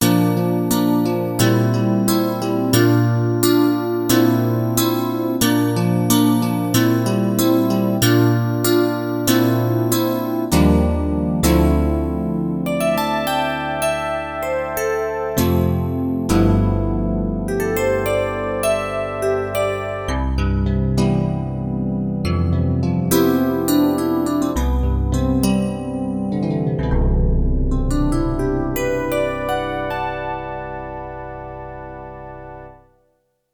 Metallic Rhodes, Roland JD-800; Factory Patches

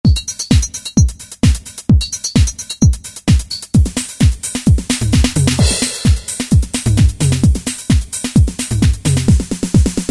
Techno, House, Boom, Disco patterns, Boss DR-770; Preset Patterns

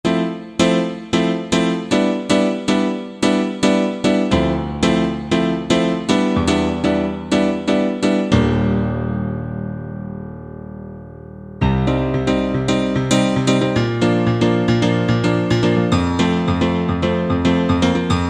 M1 Piano, Korg N364; Factory Prog demos

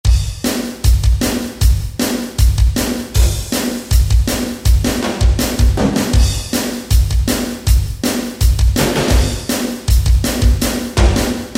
Drum Set

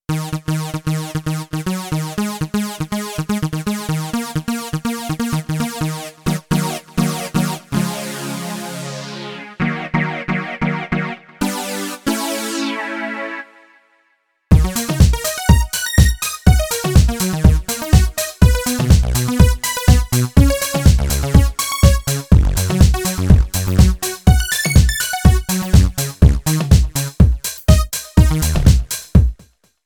House Stabz, Korg Radias

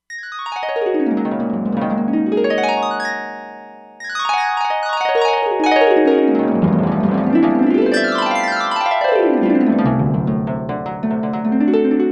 Harp; E-mu Proteus-2

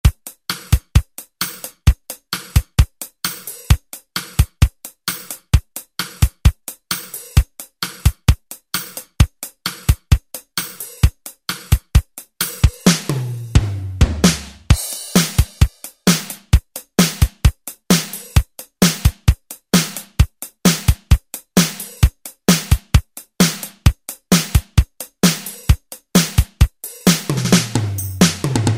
Country Rock, Alesis SR-16, Preset Patterns

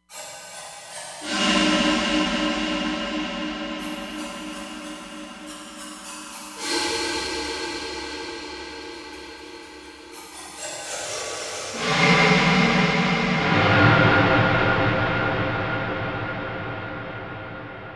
Breath Echo

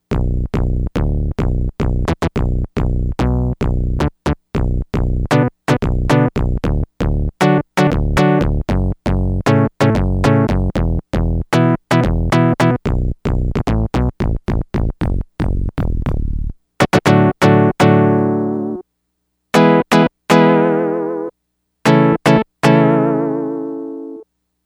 Easy Synth, Yamaha DX100; Factory Patches

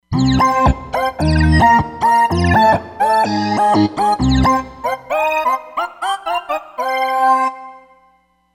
Deep Wine, Roland Fantom XR

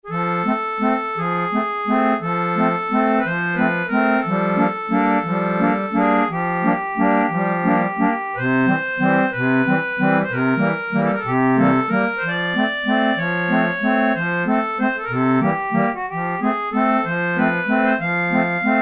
HARMONIUM, American Heartland; Sonic Reality

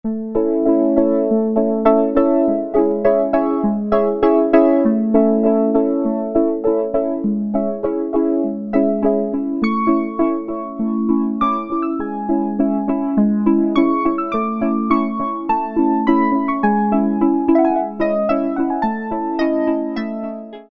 E Piano, Roland Alpha Juno-2; Factory B

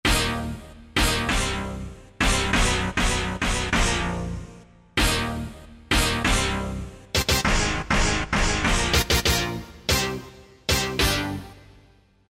Chord Hit; Roland SRX-05 Supreme Dance

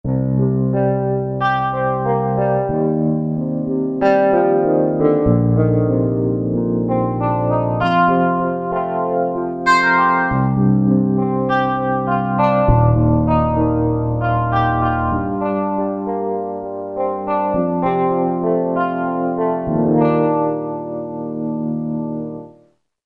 Tap E.P.; Korg MSC-14S Sound Effects

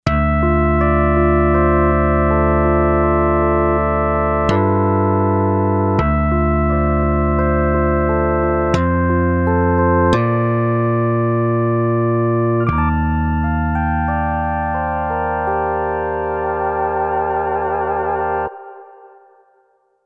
FM Split; Roland SC-880; Factory Patches

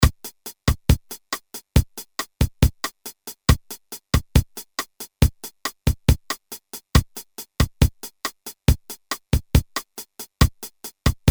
Bossanova, Boss DR-220A; Preset Patterns

